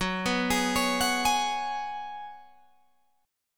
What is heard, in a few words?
F sharp Suspended 2nd Suspended 4th, Guitar, Open G; chordshape; down